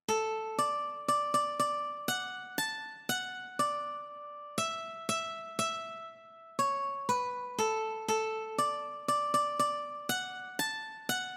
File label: The wheels on the bus Ukulele one String